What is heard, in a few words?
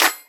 Clap; 7rystan's 600+ FREE EDM SAMPLES & DRUMS; Percussion